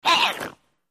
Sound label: Violent Cat Snarl; animals cat